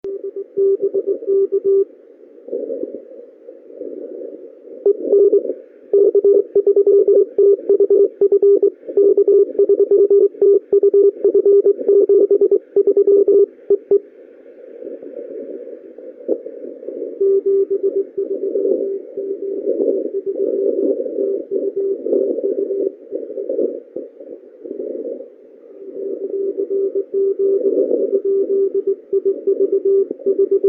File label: distortion; K3TUF